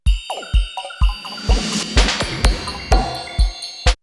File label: R8, drumloops, DrumMachines; X-Static Goldmine; 90 s Sample CDs